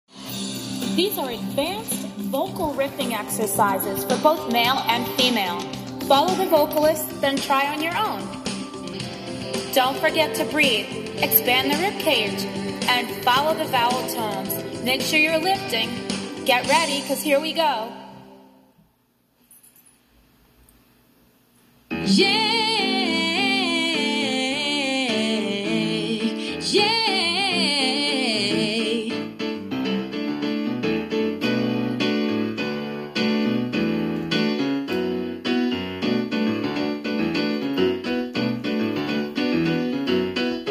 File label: advanced riffing skill